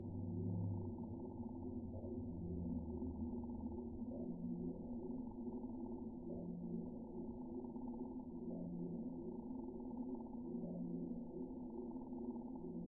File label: woodpeckers